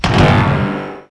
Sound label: xray shot; Tanks